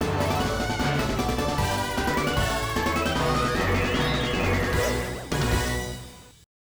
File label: Stage Clear BGM; asterix arcade